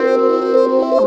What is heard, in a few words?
philter; mystic; Sonic Foundry (Sony Creative Software); Ugly Remnants VOL-2